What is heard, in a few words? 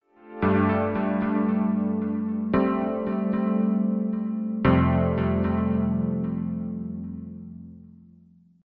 galaxy note ringtone; blue